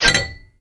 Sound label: armor